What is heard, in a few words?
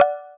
chord open; Motorola ROKR-Z6